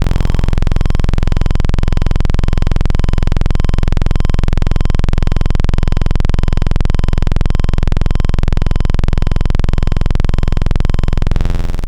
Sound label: One Shot BS Basix, synth; Wavetables; Synth One Shots; Synthetic's Serum Collection; ESW Core Wavetables; Echo Sound Works Core Tables